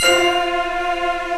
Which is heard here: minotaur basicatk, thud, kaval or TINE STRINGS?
TINE STRINGS